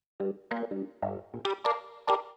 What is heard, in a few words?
ORGAN 96 G; old school